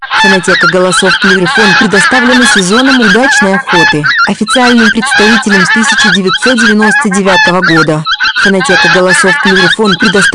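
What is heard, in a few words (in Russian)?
гуменник, манок; plurifon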